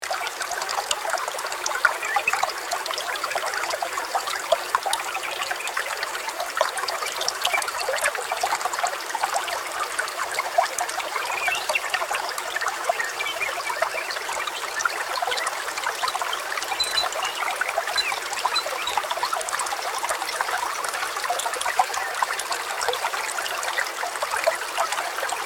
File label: stream